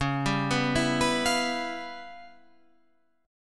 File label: C sharp 7th Sharp 9th Flat 5th; down; chord shape